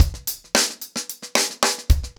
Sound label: Hip Hop 110BPM, acoustic drums drumloops